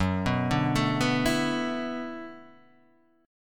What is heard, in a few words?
D 6th Add 9th F sharp